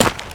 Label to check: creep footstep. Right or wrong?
Right